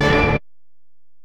Bell, Alesis DM-PRO

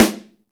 snare, Roland; Club-50 - Foundations Roland; Ext.Snare 1

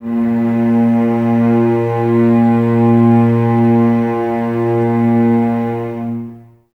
Cellos A#2, String Ensembles, No Vib; Miroslav Vitous

